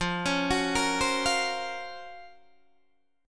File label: F sharp Major Sharp 11th F